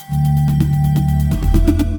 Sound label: powerful outco, beat